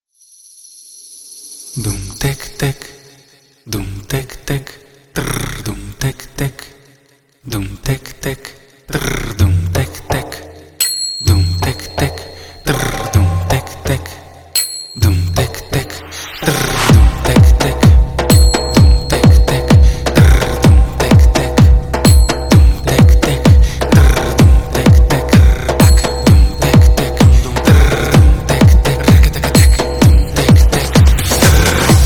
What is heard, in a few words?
DRUM SOLO JUNIOR